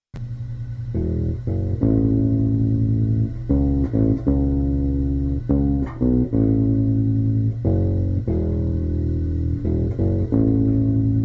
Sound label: Bass sound